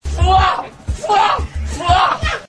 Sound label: fua fua fua